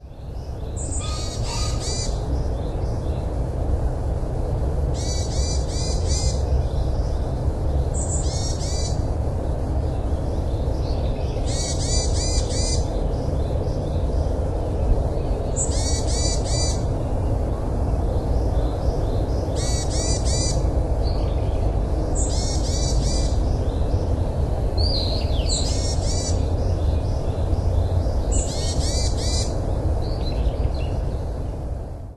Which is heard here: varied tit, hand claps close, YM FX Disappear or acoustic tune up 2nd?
varied tit